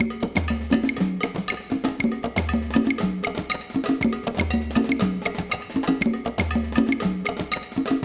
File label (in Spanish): conga de comparsa